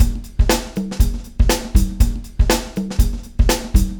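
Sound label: 120 beat; Big Fish Audio - DOM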